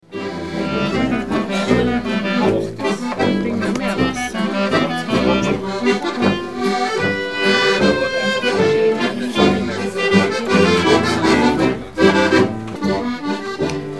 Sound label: Boarischer